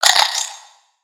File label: Spider Get Hit